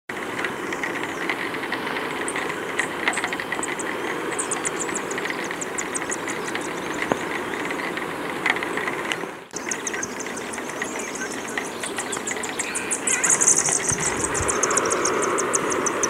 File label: great sapphirewing